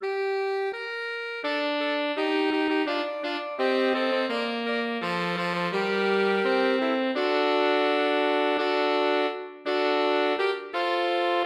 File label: Quintet, saxquintet; Die Himmel erzahlen